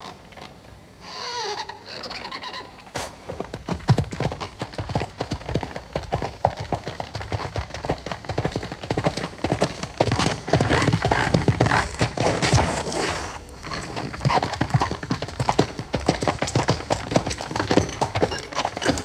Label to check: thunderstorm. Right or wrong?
Wrong